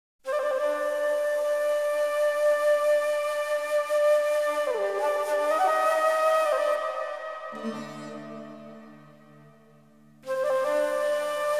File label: Semi Improvisation